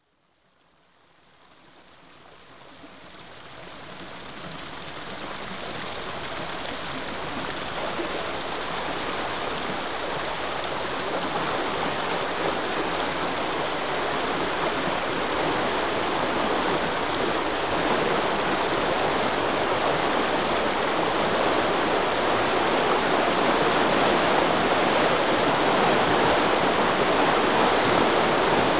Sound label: Rivers And Streams, River Lock; Rivers Streams Water; BBC Sound Effects Library